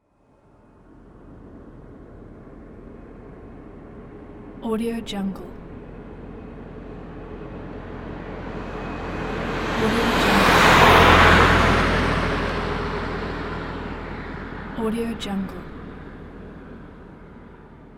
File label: car pass by